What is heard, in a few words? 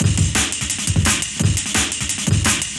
Choppy, Loops, breaks; m8 breaks